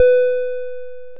timeout

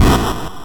falling wall

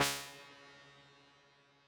shoot; antiguo; nespa

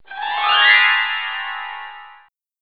harp

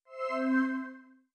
shutdown, sounds; chromium; chromeos

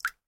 dripping water drip on water; hades revisited

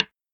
dice place; minetest

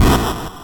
stone

